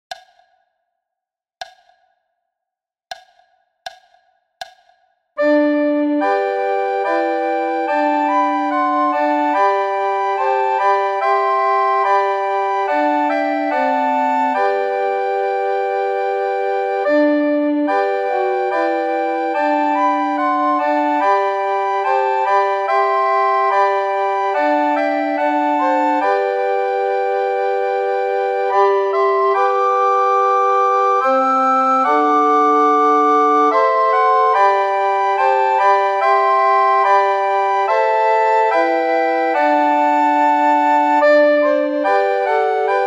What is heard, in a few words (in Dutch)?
sopraan; Flierefluiter 5